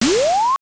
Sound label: fire skill; heros